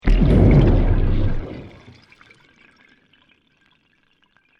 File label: EXPLOSION UNDERWATER SMALL, Created explosion events, underwater explosive event; Bomb Sound Effects